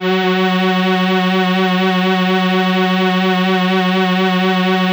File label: SYN, ENSEMBLE; Best Service ProSamples vol.55 - Retro Sampler; AKAI